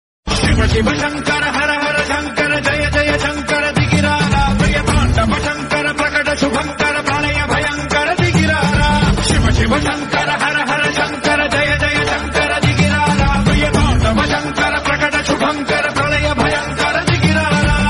Telugu song

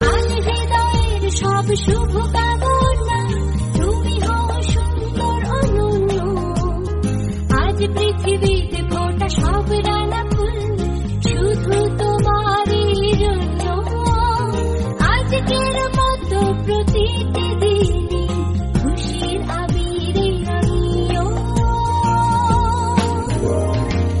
happy birthday song

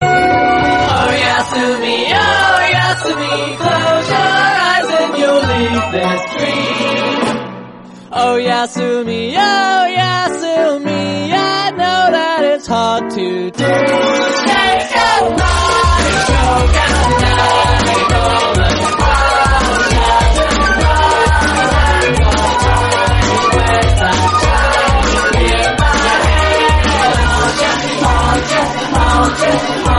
8 bit

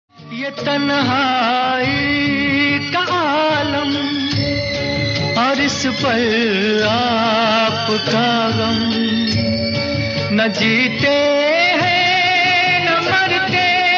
sad song

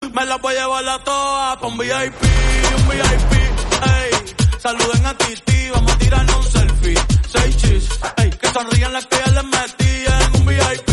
new tone

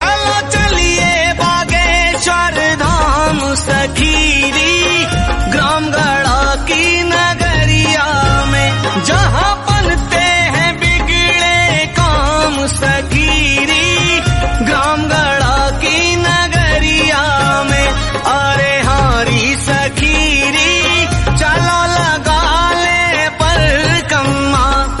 song; bageshwar dham

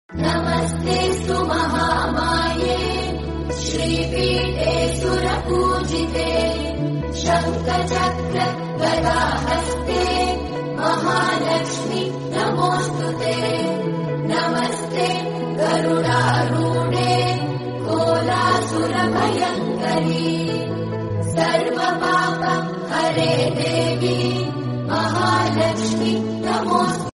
lakshmi devotional

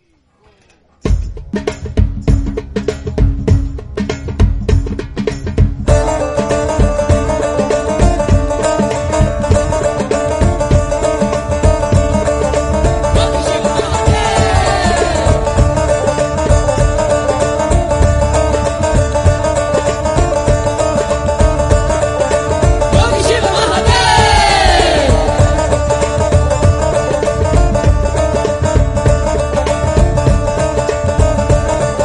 instrumental

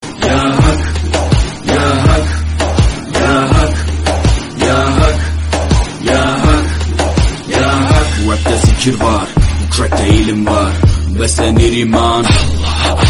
islamic bgm; ertugrul

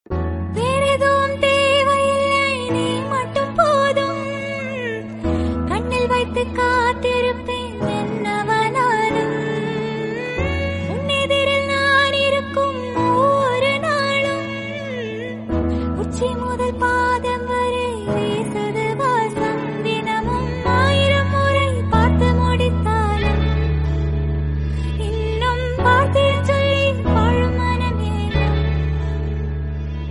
female version

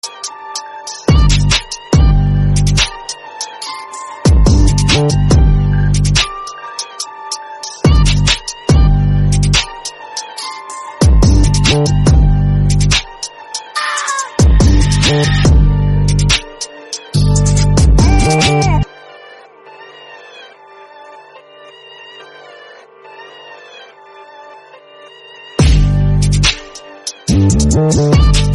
english instrumental

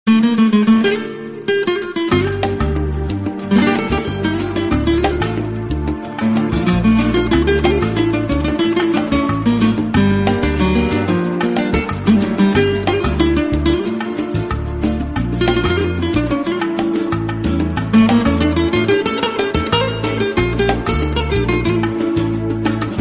flamenco guitar

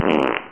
big fart

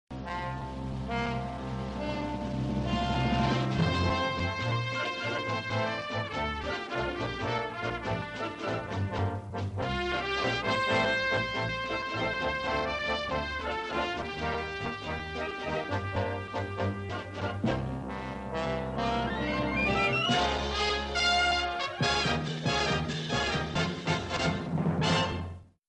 theme song; gunsmoke

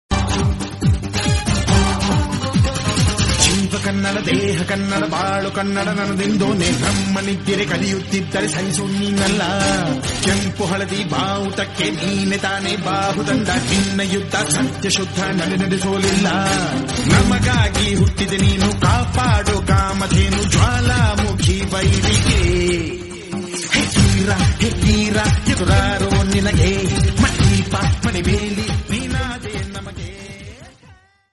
kannada song

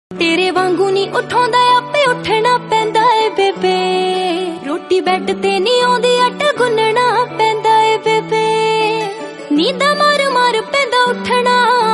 punjabi song

